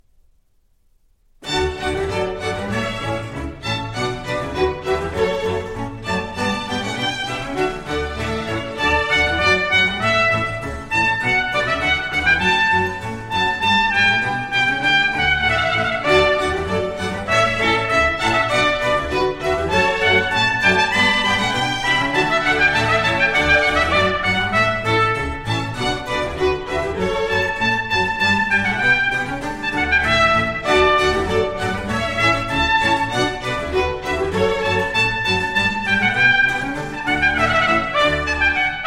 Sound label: Concerto in D major for trumpet and orchestra, Allegro; The Art of Maurice André